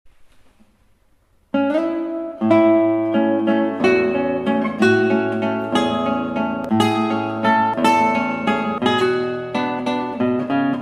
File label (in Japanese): グランワルツ, ギター; グランワルツ、マリンで